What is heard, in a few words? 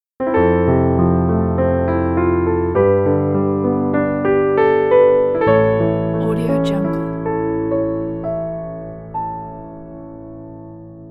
solo piano logo